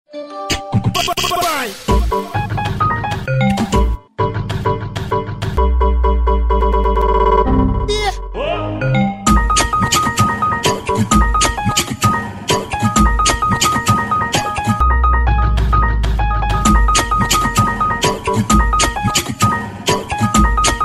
Funk Windows; toques para celular

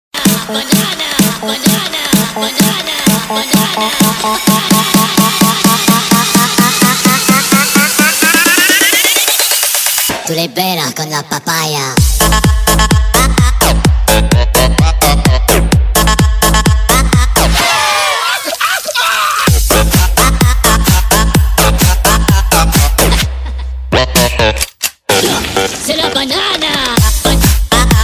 papaya dance music ring

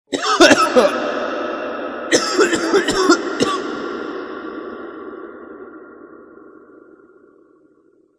cave cough ringtone; tossindo na caverna

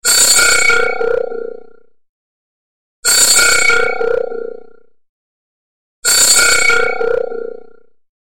crazy ringing ring

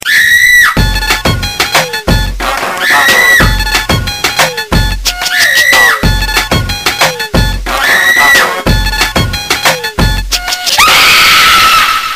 Remix, gritos remix

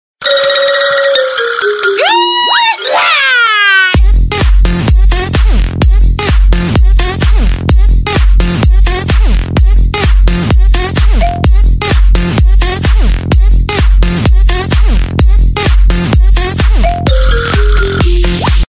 cowboy dance ringtone

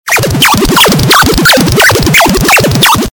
crazy alien tone

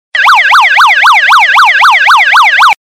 modern police sirene

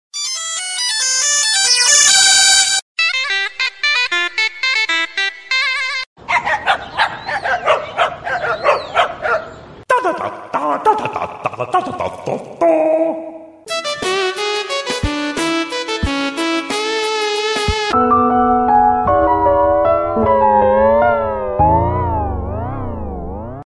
nokia funny remix; toque da nokia funny remixado

